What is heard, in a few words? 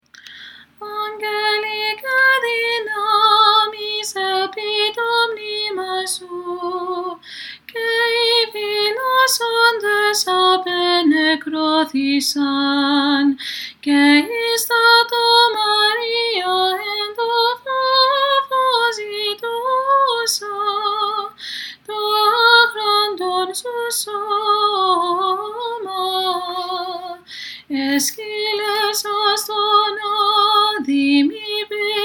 Apolitikion Tone 6 Greek; Aggelikai DInamis